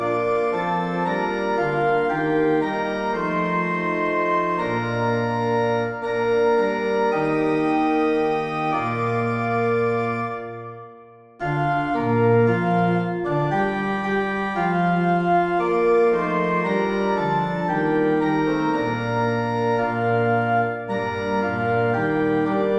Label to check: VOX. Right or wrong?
Wrong